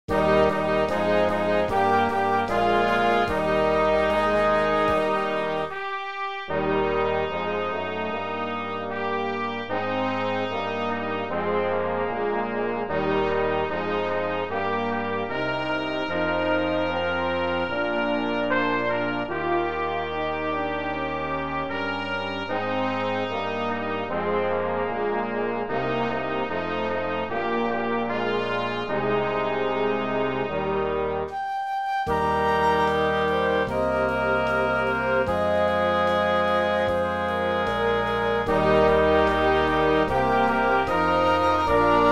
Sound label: swing low playback